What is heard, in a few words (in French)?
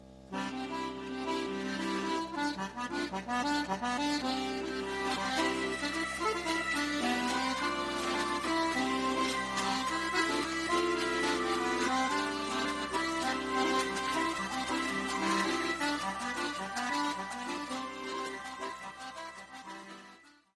GIGUE - France